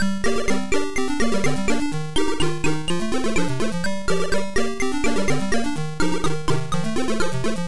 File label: tears; Zhenya